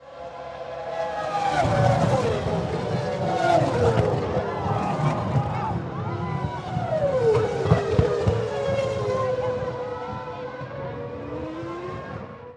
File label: Car Collapsed